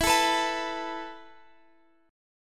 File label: E Suspended 4th E, down, Guitar, Open F